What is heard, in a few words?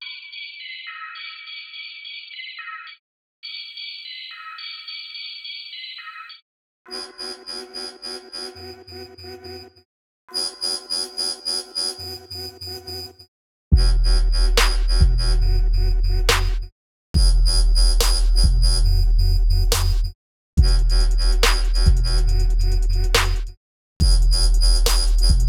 Trap; Bebe; BEBEMIX